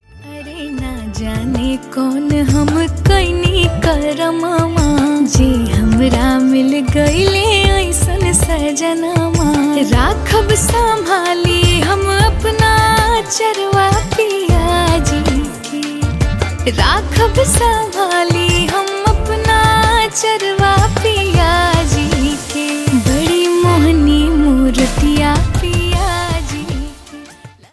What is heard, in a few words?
Ringtone